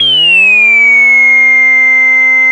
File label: WHALE; CMP - Analogon